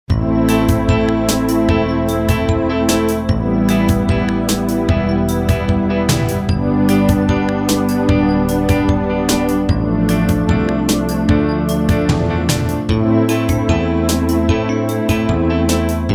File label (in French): VERSION KARAOKE; Alex et Zoé 3